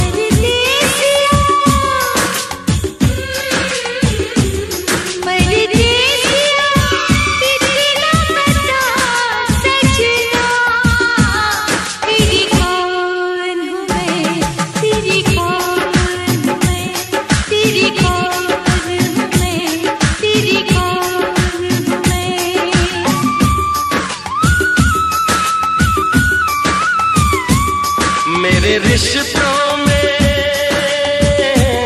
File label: Old Hindi Song Ringtone